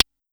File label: Pollard Perc, Syndrum-178